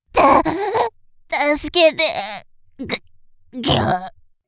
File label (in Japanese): 首絞め風; 失神直前